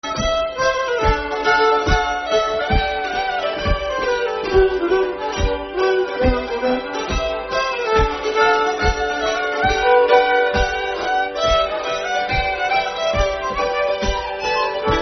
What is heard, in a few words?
Schottische; Nameless Schottische